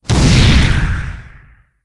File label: chaos bolt